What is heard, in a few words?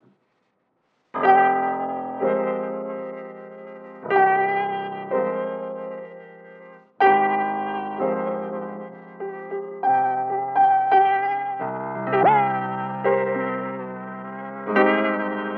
Piano loop tape Cassette; WavesFactory